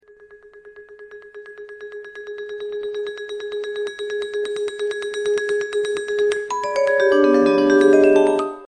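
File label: vibraphone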